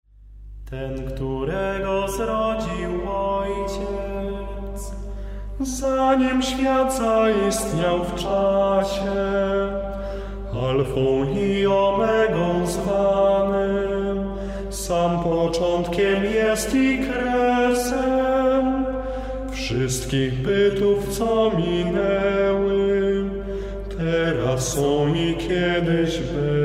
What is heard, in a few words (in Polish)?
Hymn Ten 6 wersow